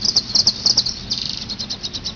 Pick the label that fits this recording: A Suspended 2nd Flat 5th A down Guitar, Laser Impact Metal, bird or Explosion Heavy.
bird